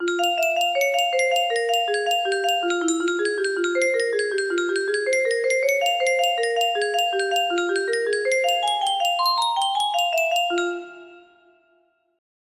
beep, music box melody

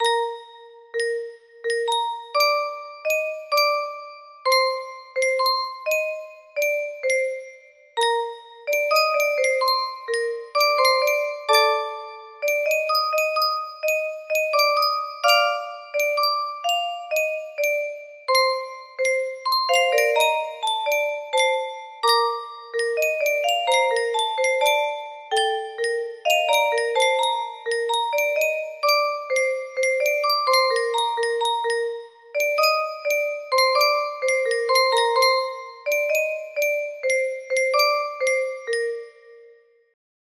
short, music box melody

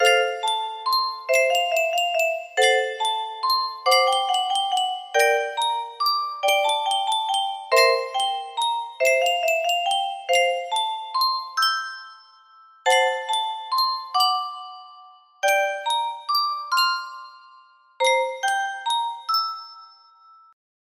elegy, music box melody

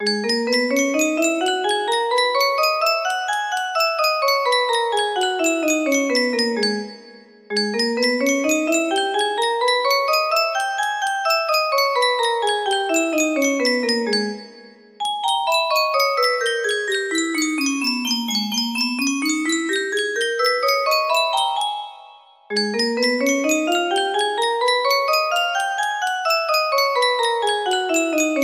g sharp minor, music box

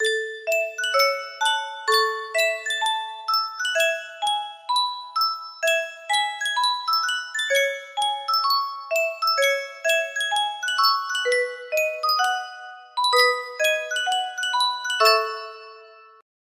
Yunsheng music box, music box melody

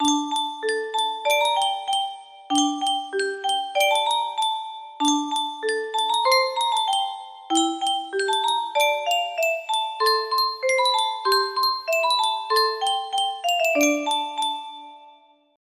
music box, music box melody

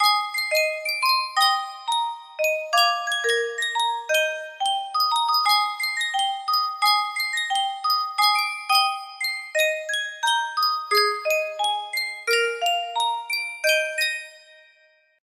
Sankyo custom tune music box

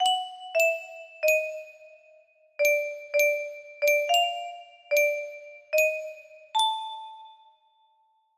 music box melody

